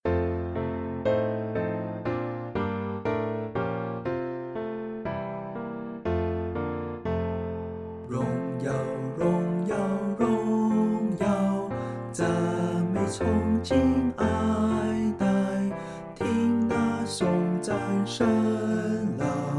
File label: vocal; Hymns; Chinese